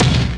TEK THUD, INDUSTRIAL; Roland - LA Composer vol. 1

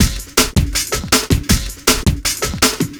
Classic Beat, Drum N Bass

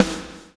BLOW; Spectrasonics - Backbeat

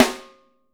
wood; Sampleheads; Peter Erskine Living Drums

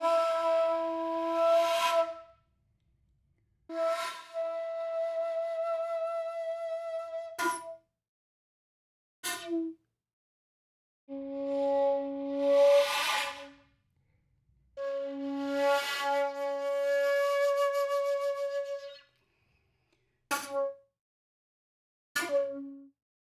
shakuhachi overblow

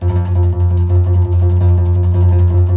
C. Bass Balalaika, Plucked Strings; E-MU Producer Series Vol. 7 – Old World Instruments

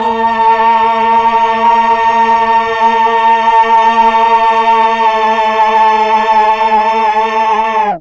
MELLOTRON vox; Big Fish Audio - First Call Vintage Keyboards

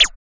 XTRA PERC

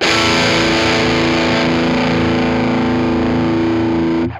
GRUNG SUS4, Guitar; 90 s Sample CDs; Quantum Leap - Guitar & Bass

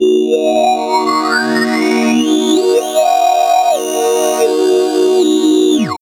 Vocomodo Eb, Drum & Bass Pads; Total Drum&Bass; 90 s Sample CDs

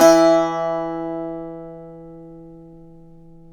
SAZ; Spectrasonics; Hans Zimmer Guitars VOL-2

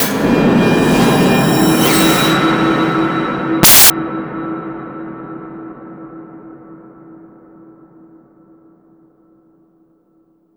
sci-fi sting; Spectrasonic Distorted Reality 1

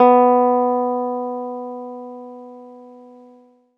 ZITHER; Best Service; Alpine Volksmusik

